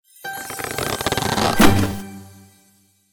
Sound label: openbox